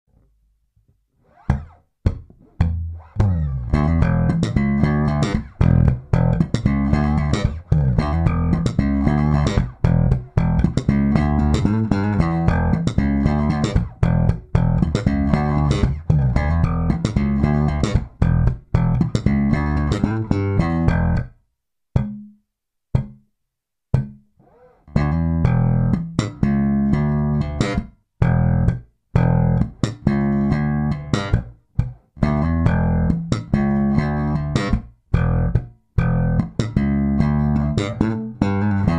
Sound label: Slap groove in E